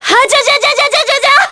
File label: Vox Casting; Scarlet; kingsraid